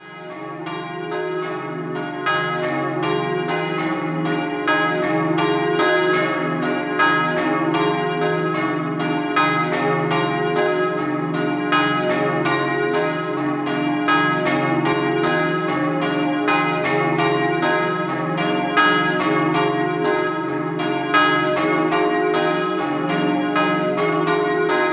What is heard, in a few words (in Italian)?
Doppio, campane; Pozzo